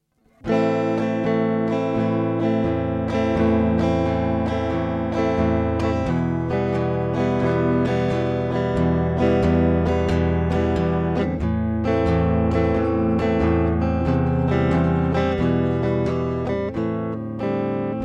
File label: clean, gitarre harley benton te 70; black paisley